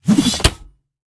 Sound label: acidtrap knife rotate